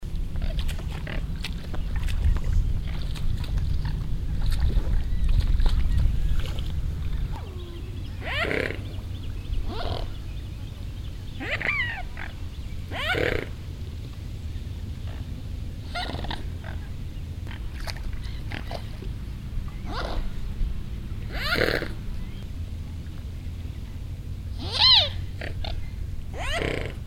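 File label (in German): Schwäne Bodensee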